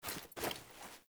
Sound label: Foley Action Default Soul Steal Combined, Foley Default Clothing; Characters; Player